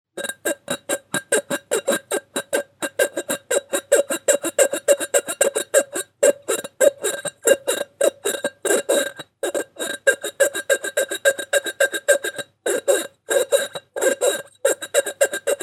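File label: clean dishes sound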